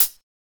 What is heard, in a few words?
HiHats closed, trap drum kit; MZ BEATS - FREE TRAP DRUM KIT